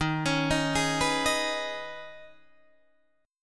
D sharp 7th Suspended 4th Sharp 5th D sharp, Guitar, Open D